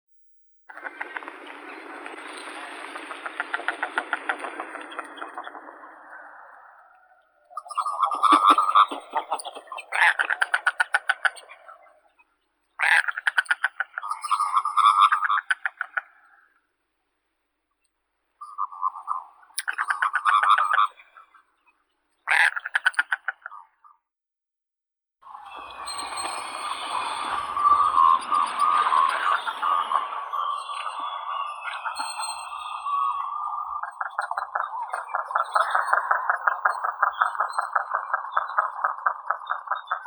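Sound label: goosander, Mergus merganser; krohal bolshoi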